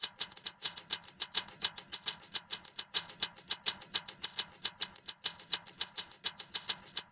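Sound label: drum, separated component 1; drum pattern 3; Separation of Sound Sources by Convolutive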